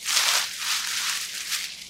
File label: bush; casualtiesunknown